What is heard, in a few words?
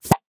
suction; soundscrate